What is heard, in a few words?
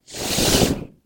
slash attack